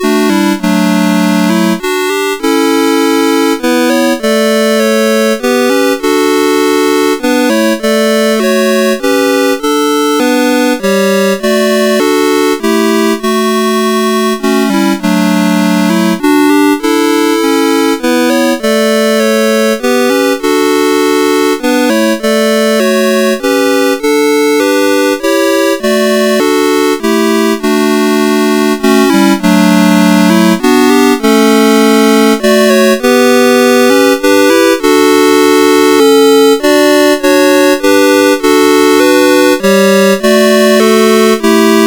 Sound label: First Nowell, CSG8580R5